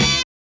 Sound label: Hip-Hop Kit Stab; Roland Juno-D